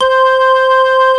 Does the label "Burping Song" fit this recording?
No